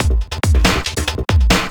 Beat, Drum N Bass

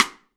Rim; GM DRUMKIT

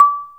MARIMBA C6; AKAI S-Series CD-ROM Sound Library VOL-2

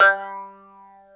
throat, JD8 attack

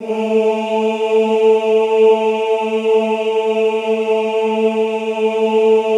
OOH A2, Classical Choir; Best Service; Extended Classical Choir; OOH MIX

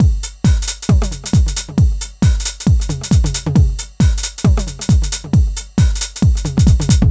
DRM, House, LOOPS 135; Best Service ProSamples vol.10 - House; AKAI

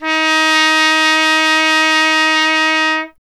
trumpet, D#0; Miroslav Vitous - Solo Instruments VOL-1

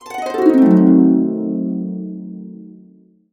C DIM7, Harps; USB Soundscan vol.62 - Complete Harps; AKAI

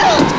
Fem Machine

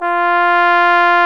Solo Brass, Trombone, Alto Bone; BRS Alto Bone

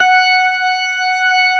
STOPS, organ combos

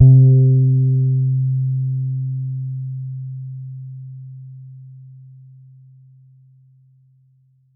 Es C4 soft, Bass, Pedulla Thunderbass; Giga Samples Collection

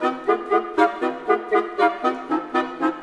Orchestral Loops; 128 VERTIGO

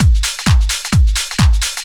drum 130 trance

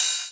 Ride; Equipped Music; Loungin House

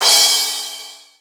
Cymbal, Drum One Shots; AMG - Coldcut's Kleptomania VOL-1

